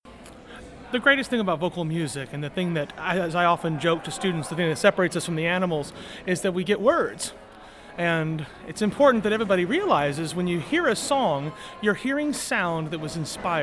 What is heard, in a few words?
Vocal Music Evolved